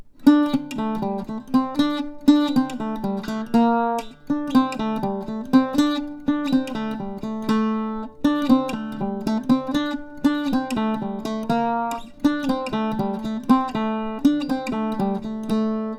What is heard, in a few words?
Dry Octave